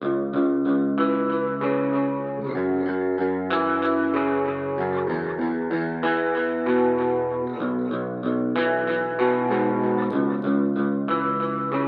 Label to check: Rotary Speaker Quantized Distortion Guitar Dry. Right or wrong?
Right